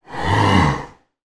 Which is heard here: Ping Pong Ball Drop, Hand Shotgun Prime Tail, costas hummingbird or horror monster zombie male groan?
horror monster zombie male groan